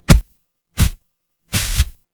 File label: paper fabric fabric